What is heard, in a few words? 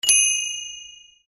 reel spin click; The Nut Cracker